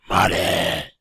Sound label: yeti attack; server-nalc